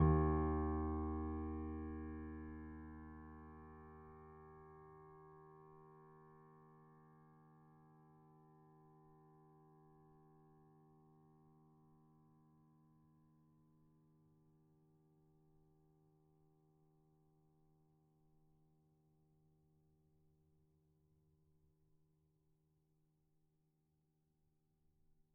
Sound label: D#2, Grand Piano; bitKlavier Grand; Piano Bar